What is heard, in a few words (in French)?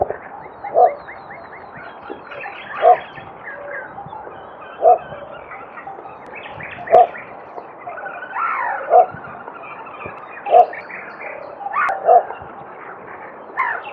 Blongios nain; Stanislas Wroza; xeno-canto